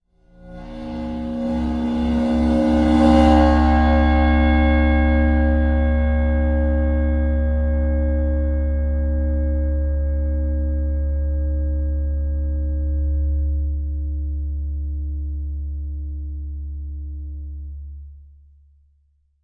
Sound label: Ride Cymbal Bow, cymbal & gong; Old Ride Cymbol Bow CU 5